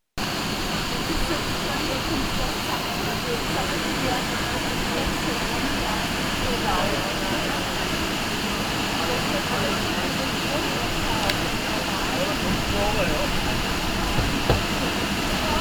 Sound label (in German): Entlang der Polga, Vorarlberg